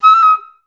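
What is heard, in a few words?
flutes D#5; Best Service; Peter Siedlaczek's Advanced Orchestra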